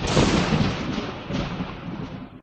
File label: lightning bolt sound; sfx; WILLIAMS; INDIANA JONES; Indiana-Jones-Original